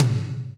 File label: TOM TOM, Roland